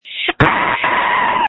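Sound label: Scream, phone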